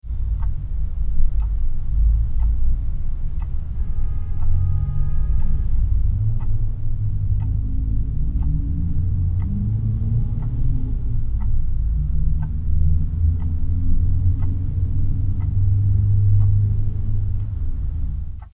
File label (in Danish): forsats 20 cm 15 db finetuned med urlavt